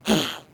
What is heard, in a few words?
carry; DonkeysAndDroids.Godot